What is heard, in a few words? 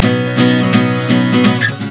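guitar string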